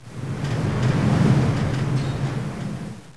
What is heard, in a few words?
wnd, wind